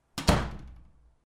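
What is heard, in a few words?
door slam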